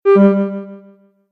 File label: Message Alert, beep